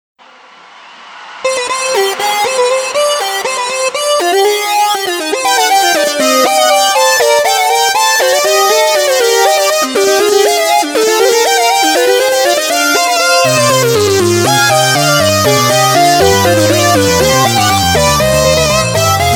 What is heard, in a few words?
fugue C minor